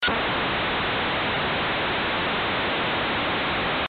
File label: internet sound